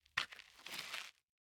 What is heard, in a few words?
Look Back; LOOPERS